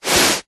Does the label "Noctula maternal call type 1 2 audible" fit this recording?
No